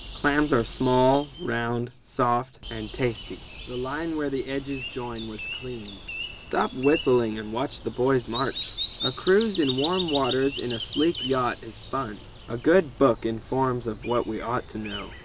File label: birds